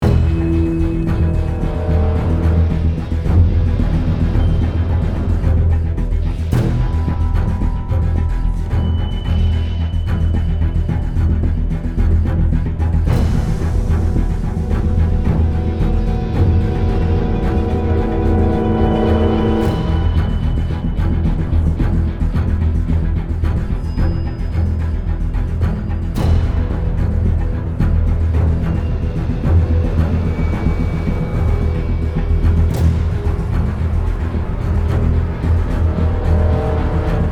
Stealth (Loop); The Walking Dead: The Telltale Series

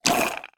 Feedback aspire

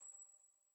Footstep Twinkle; Harmony Hermit

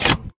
Mechanicals smaller

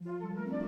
Deception Start 50bpm; Music Dialogue

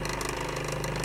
Elevator Creak Loop

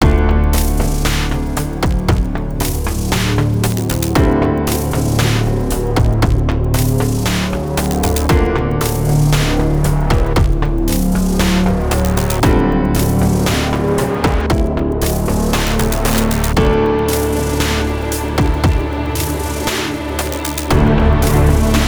Music Main Theme loop; Foreclosed

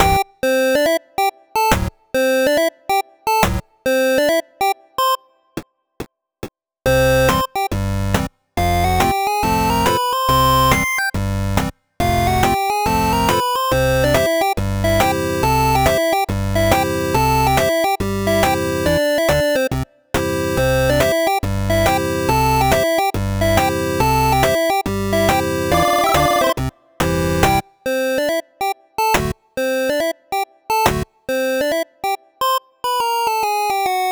chiptune Rag; tetris chiptune arrangements; Jstris Cup Rag

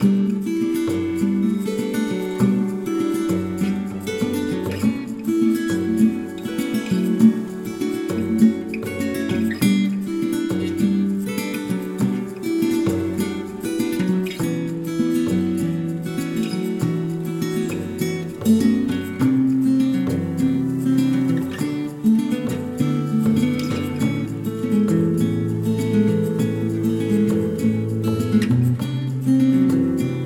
Ruins Loop; Blue Sheep

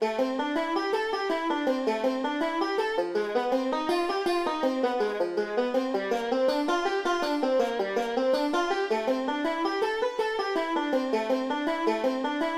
Contrast LeadMusic

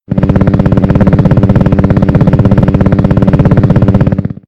Propeller; Doodle Jump; 3DS, Android, Arcade, DS, iOS, Mobile, Xbox 360

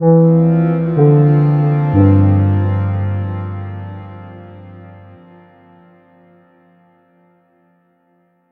logo splash

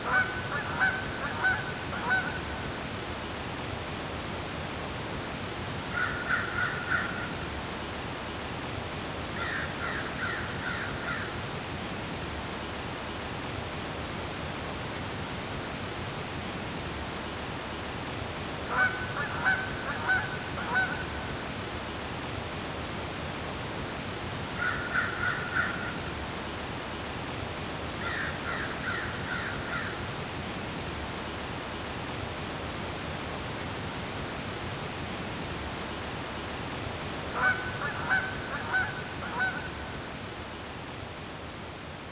Corn Field; Remington Great American Bird Hunt; wii

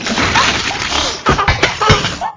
Robot Assamble; Clickergame

